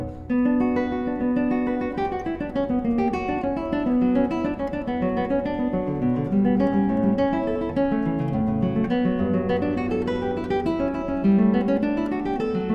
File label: Partita for solo violin in B minor Double; BWV 1002; Bach; The Six Sonatas and Partitas